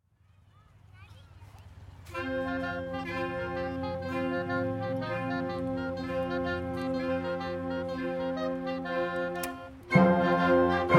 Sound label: Jig, Community Band